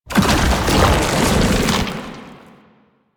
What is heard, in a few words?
Tank Infested Swipe Charge; Enemies; Abilities; Warframe; Lasrian